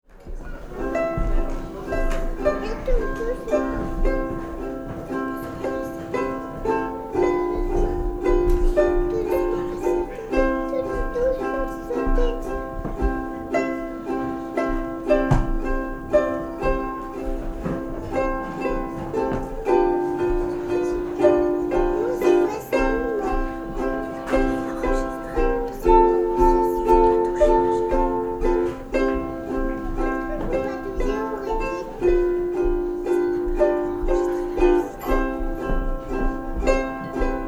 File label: waltz, ukulele